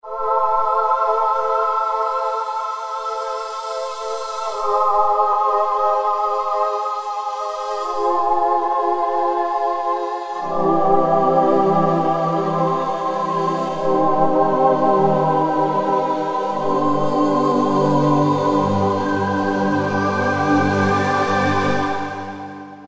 CH Spirit, Yamaha TG77; Factory Presets

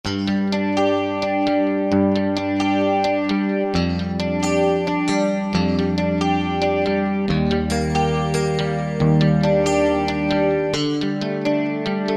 Fat Strat, Alesis QS8; Factory Preset examples

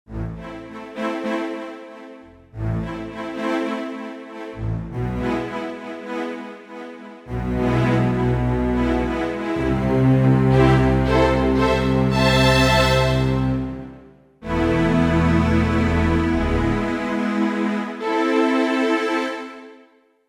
Velo Strings, Roland JV-880; Factory Patches

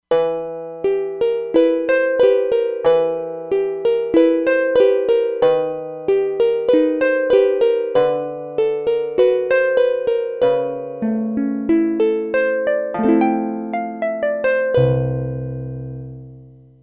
Soft Harp, E-mu Proteus-2; Factory Presets

